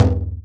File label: BASS, INDIAN PERC